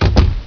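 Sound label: door close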